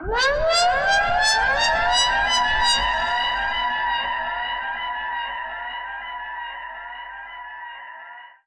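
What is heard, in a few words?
alarm siren, modulation processed, 170bpm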